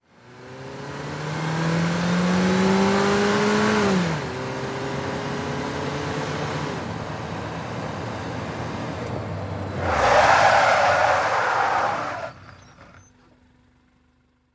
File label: Sm Car Accl Skid, compact